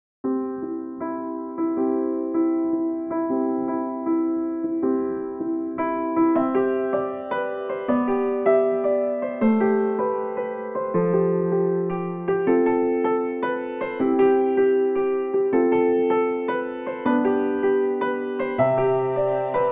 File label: Wedding Music